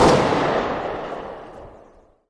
m249 distant, weapons; Counter-Strike Global Offensive; csgo

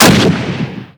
BAR Shoot; Minecraft; Infamy; Last Alive Pack

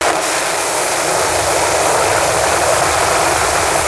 burn, flaregun; weapons; Team Fortress 2; hl2

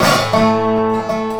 dobro danger

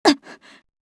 hurt; GrandFantasia

